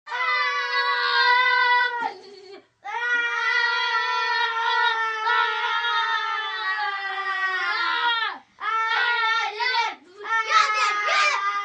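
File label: 2 Boys Play War Machine Gun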